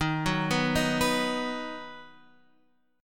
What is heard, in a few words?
B-Major D#